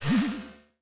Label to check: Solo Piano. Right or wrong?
Wrong